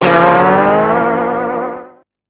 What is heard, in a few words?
Warning, Alcatel phone tones; Alcatel OT-756